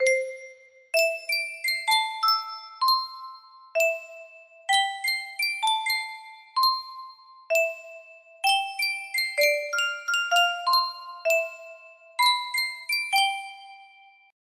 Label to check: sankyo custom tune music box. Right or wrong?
Right